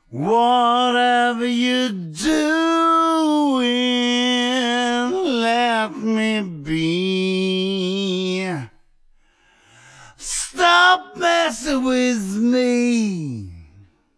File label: Singing Voice; TSMtoolbox